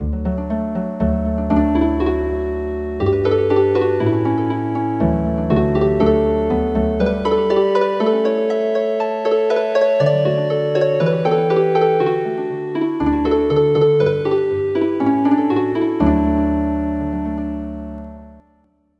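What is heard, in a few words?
Healing Harp; Lullaby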